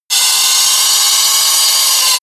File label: grinder bright on load